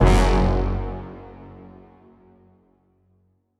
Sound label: Game Over